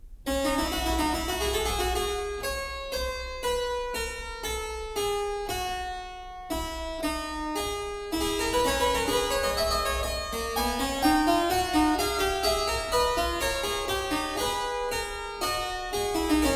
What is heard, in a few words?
fugue; BACH; WTC 2; Fugue 6; BWV 875